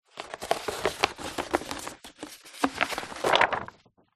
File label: paper poster run roll